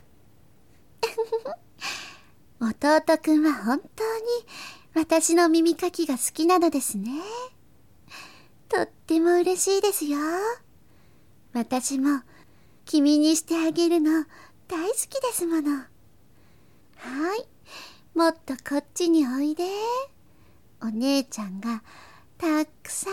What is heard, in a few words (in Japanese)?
吐息ループ+耳かき（通常編集 効果音あり大きめ）; みみあね。 ～世界で1番弟クンを甘やかすお姉ちゃん～【囁き・添い寝・立体音響】